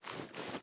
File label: reload empty fast arm, weapons; gmod; viper